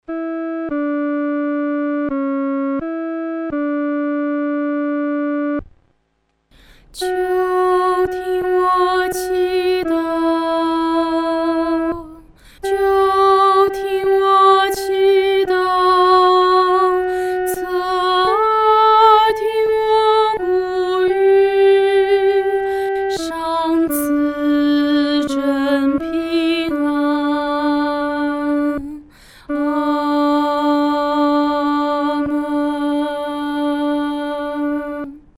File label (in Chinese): 独唱 第一声; 垂听我祷告